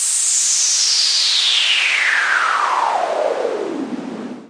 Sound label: Yamaha CS5, noise sweeps